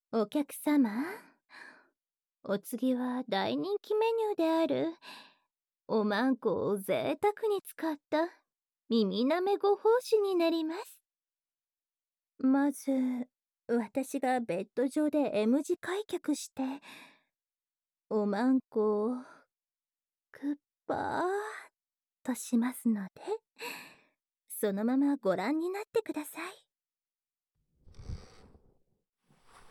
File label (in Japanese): 耳舐め ご奉仕; おまんこ枕; KU100